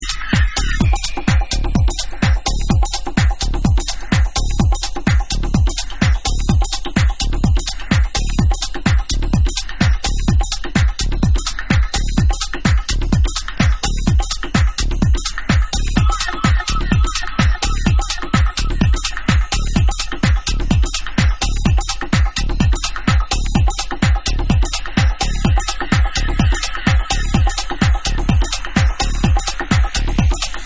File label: Dub